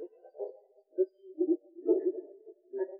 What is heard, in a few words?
Moor Frog